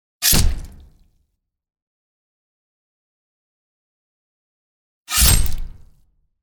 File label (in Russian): Popadanie mechom po telu